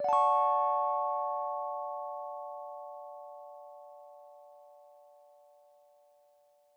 electric piano mellow d chord; Piano and Epiano

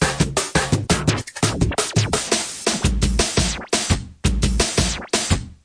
drum loops and breaks, 170 bpm; 161 to 180 bpm

